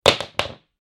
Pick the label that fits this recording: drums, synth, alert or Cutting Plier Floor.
Cutting Plier Floor